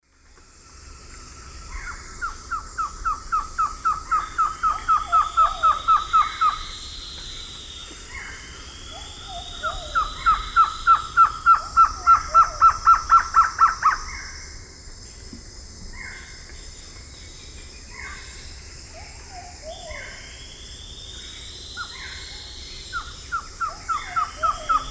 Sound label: Trogon viridis, song, Eastern Lowlands; S. America